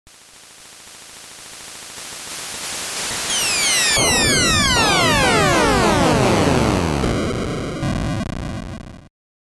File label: Massive Explosion; Maniac Mansion